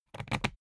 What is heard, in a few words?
briefcase plastic latch lock key insert quick; industrie wirtschaft; bueroarbeit